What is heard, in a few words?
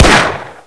glock18; csof